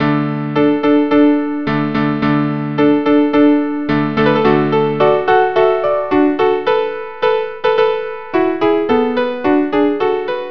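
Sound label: violin viola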